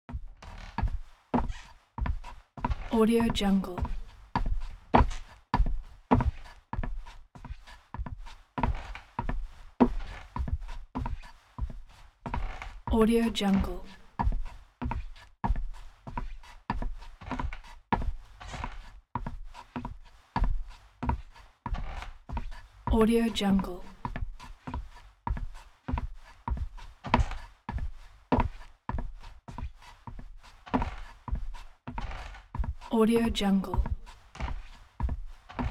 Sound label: steps boots on wood planks walk